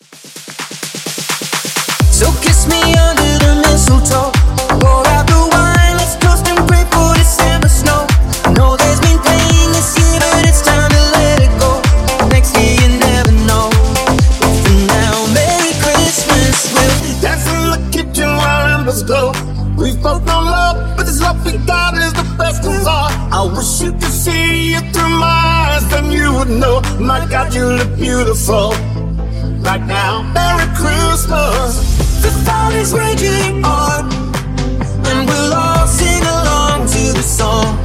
Future House Mashup; Merry Christmas

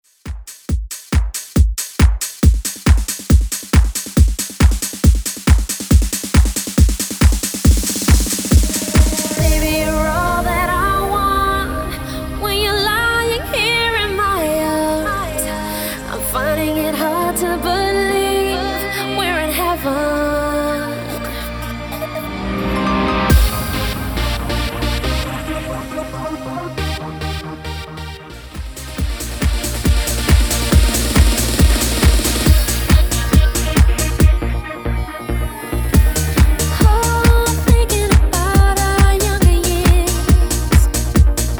2ks Dance Redrum Clean

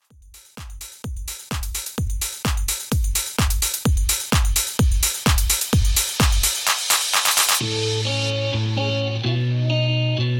Club Extended Mix 128Bpm

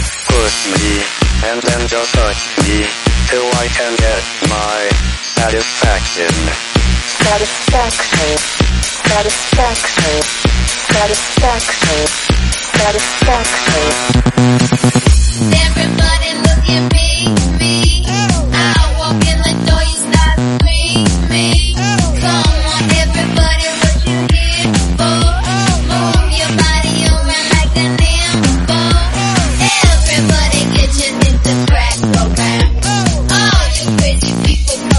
130bpm Bbm

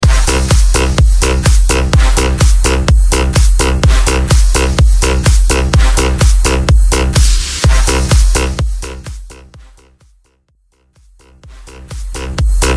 Future Bounce

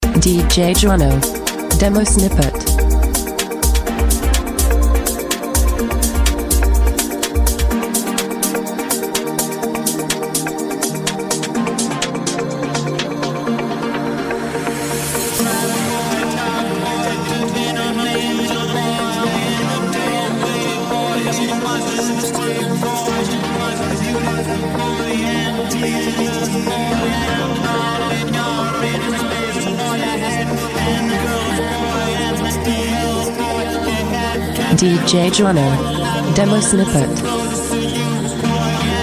HOUSE

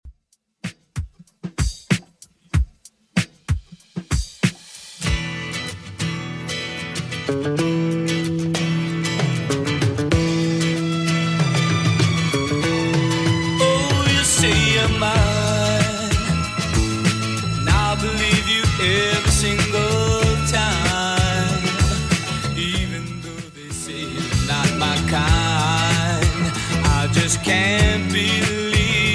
70s Redrum 95bpm

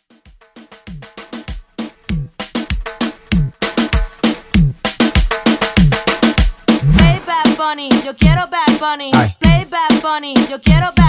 Reggaeton Party Break

